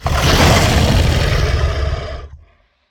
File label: Sniff, Behemoth; Evolve